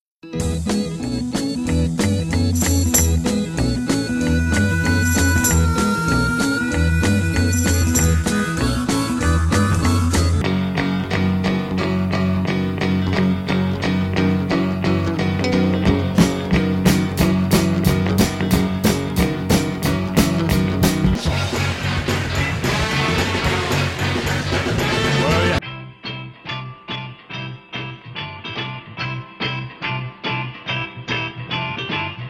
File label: riff